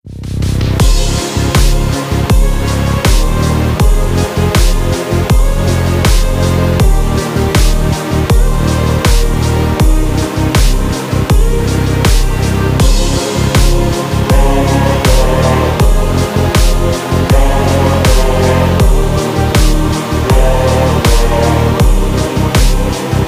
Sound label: instrumental slowed